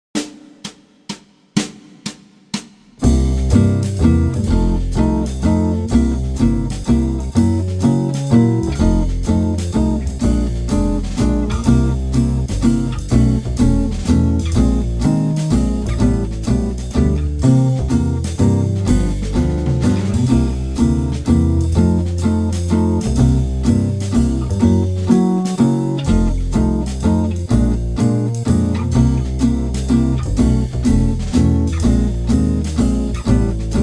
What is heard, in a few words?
Three Four Time Signature A Jazz Blues Watlz, jazz guitar